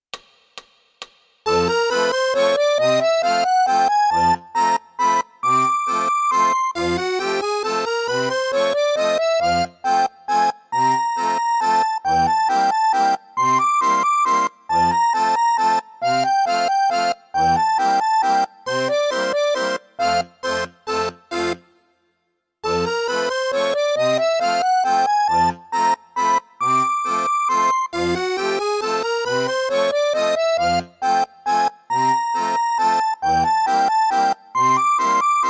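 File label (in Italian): Fisarmonica; Czerny; valzer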